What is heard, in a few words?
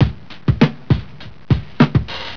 drum